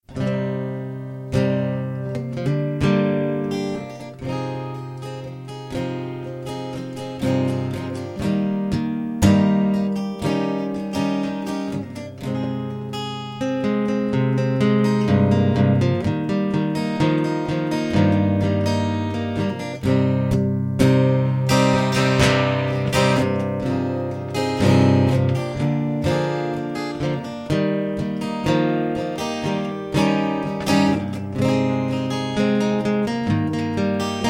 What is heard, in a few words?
Guitar Love, Instrumentals